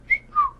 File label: Bird